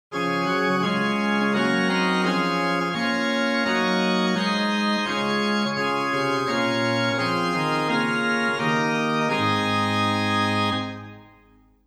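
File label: Gedackt 8 + Rohrflöte 4 + Nassat 3 + Octava 2 + Zymbeln, organ; Silbermann middle-sized church organ; St. Anne; Hin: Gedacktes 8', Rohr Fleute 4', Nassat 2-2/3', Octava 2', Zymbeln II; Reinhardtsgrimma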